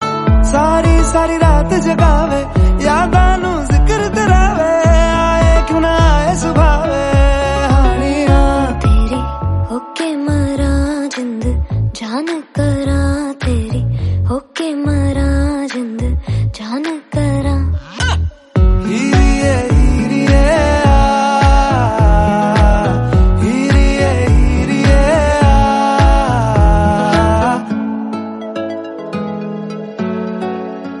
Hindi

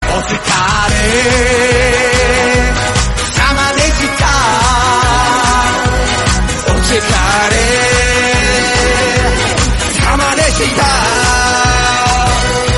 Korean Song

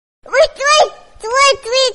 "Tui Tui Tui" Cute Baby Notification Sms Cute; ringtone

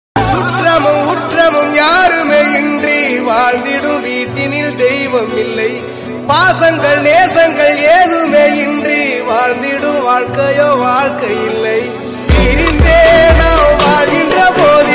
Family Song Tamil South Indian

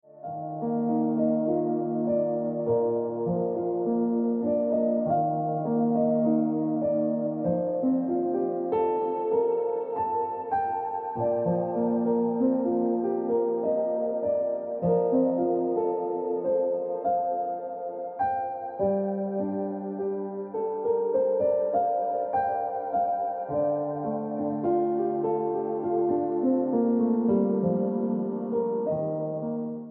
Piano Music

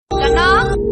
Message Tone; Rowdy Boys

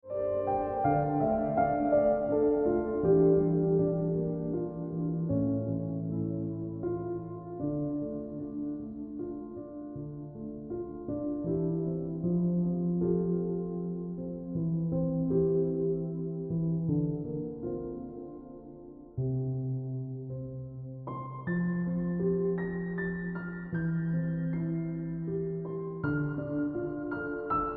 Ringtone Piano Music

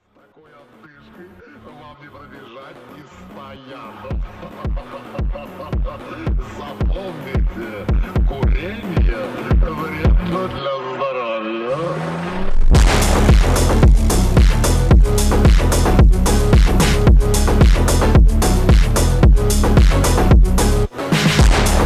Slowed Ringtone

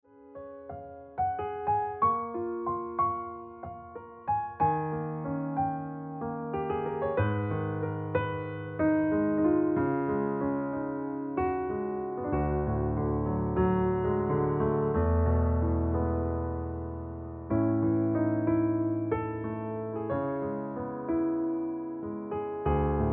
Piano Music

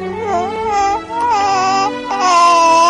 Baby Crying Dramatic Ringtone

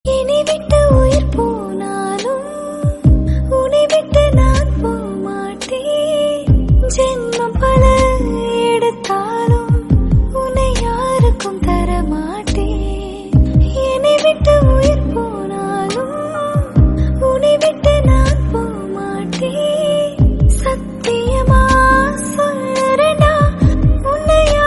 Female Version Tamil Song

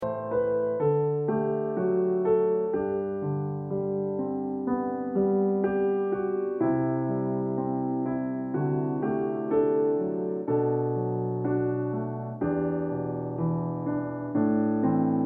Ringtone Piano Music

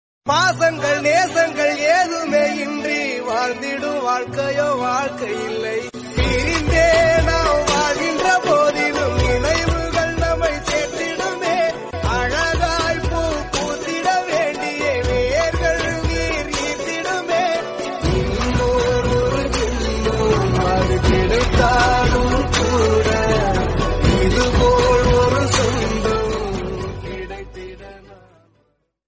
Teacher Birthday In Tamil Song; ringtone

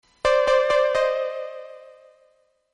sky blip

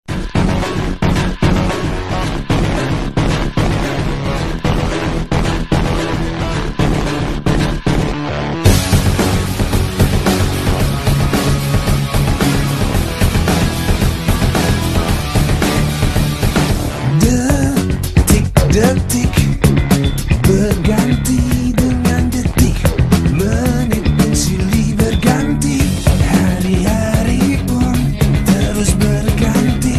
Ringtone

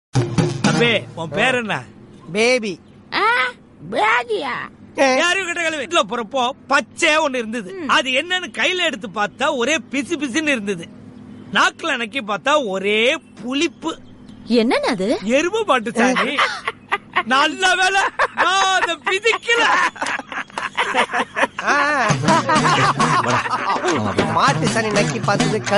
Tamil South Indian

